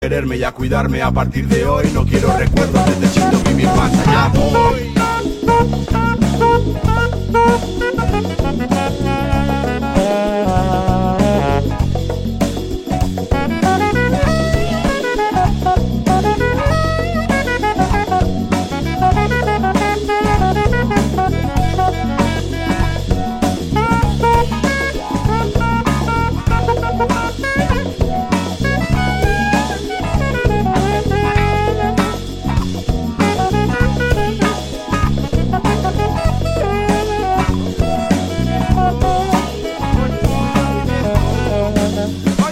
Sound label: JAZZ